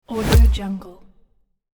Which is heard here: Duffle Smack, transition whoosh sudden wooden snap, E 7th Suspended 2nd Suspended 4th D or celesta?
transition whoosh sudden wooden snap